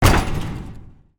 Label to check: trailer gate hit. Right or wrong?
Right